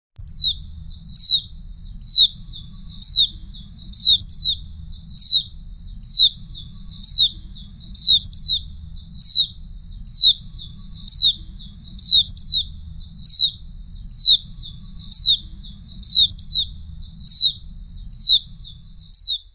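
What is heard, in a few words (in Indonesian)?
pleci betina, Indonesian bird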